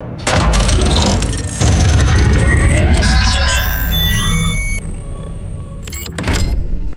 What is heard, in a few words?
sci fi mechanical lever and console power up; magic-scifi